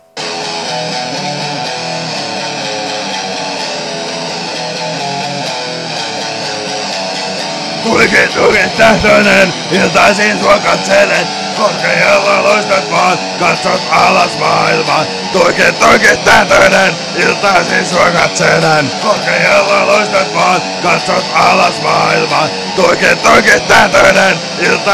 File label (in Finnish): tuiki tuiki tahtonen heavy version